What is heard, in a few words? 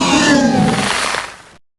Ice Elemental Death Sound; Heroes3